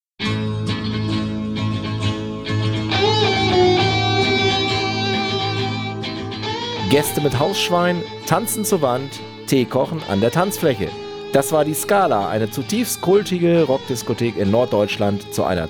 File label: jingle; scalanacht